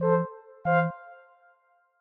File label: Tuba, notifications; Samsung Galaxy Z Fold 7; Sound Theme; Calm